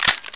glock start reload, weapons

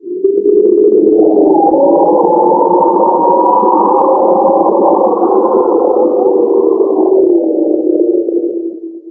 wind moan

